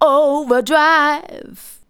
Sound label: vox, Techno Trance; Ueberschall